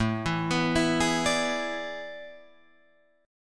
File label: A Major 7th Suspended 2nd Suspended 4th, Guitar, Drop D